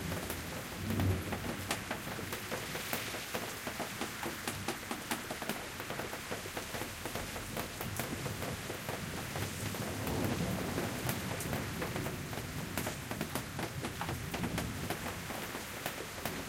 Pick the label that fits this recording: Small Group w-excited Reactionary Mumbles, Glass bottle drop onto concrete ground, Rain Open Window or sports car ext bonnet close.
Rain Open Window